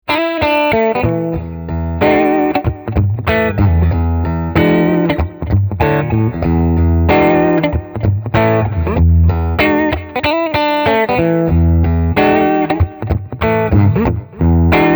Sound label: Riff n Blues